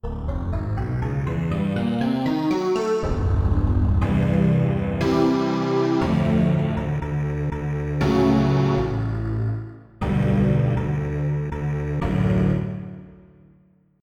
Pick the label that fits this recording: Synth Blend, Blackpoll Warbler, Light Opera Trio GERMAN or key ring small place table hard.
Synth Blend